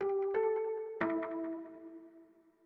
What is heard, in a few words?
ethno, 90, D